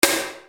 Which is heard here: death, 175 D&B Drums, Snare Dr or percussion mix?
Snare Dr